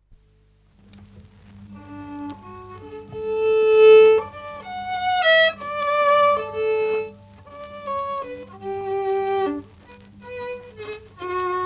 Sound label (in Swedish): Vals